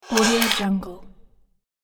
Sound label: robot core user sound effect; sonic-boom